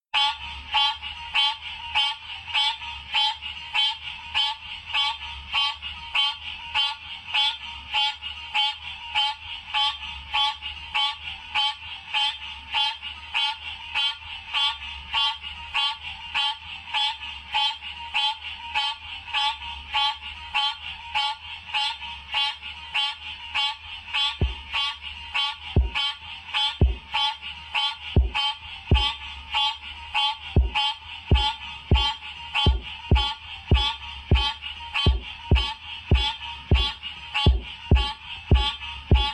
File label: frog